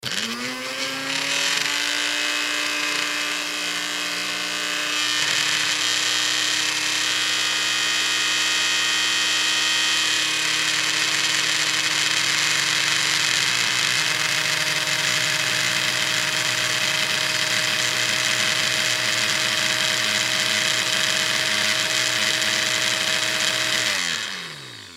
Juicer Blender Run Empty; Appliance; Blastwave

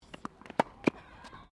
TENNIS HIT FEMALE, Local tennis club; Sports

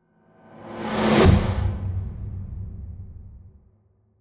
Mysterious Metal Transition Ends With A Hit; Scary Soundscapes

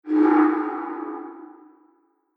Impressive Fantasy Sweep, whoosh sound effects

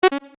Menu wrong, Wrong / Invalid Selection; Menu SFX; Button Sound Effects; Buttons (Sound Effects:Multimedia)

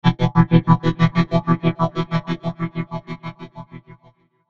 Chopper Light Flange Reverb; Blastwave; Chopper Sound Effects

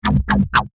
Aggressive Laser Charges; Andrew Fleming

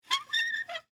Metal Hinge Open, Foley, Metal, Hinge, Open; Latches; Objects

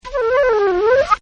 FLUTE, Woodwinds; Sound Effects:Musical

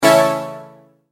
multimedia tone exclamation; McKinney Sound

